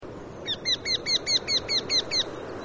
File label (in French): torcol fourmilier